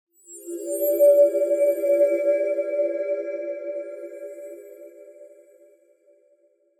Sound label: ghost; gesture